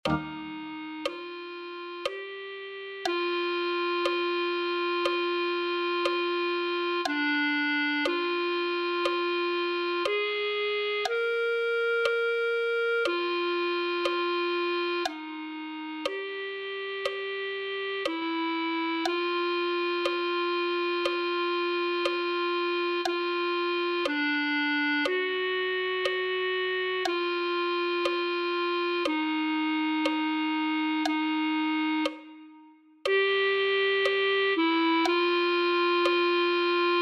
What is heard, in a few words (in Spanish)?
clarinete